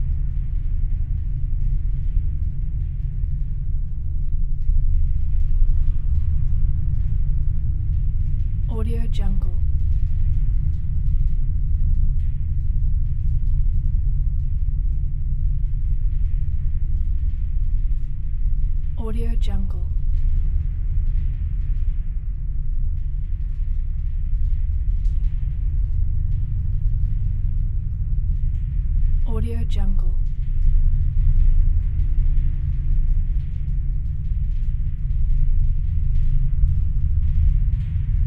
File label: deep metal rumble